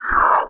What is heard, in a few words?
roar